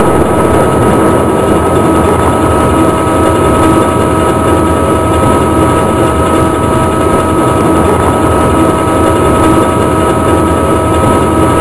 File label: alien generator